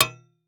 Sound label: Impact Street Sign Metal Hit By Copper Pole